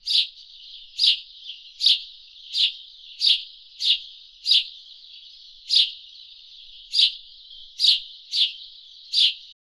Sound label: sparrow sound